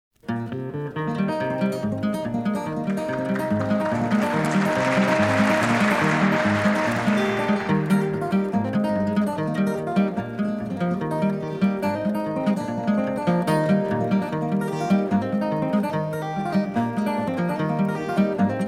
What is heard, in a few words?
Guitar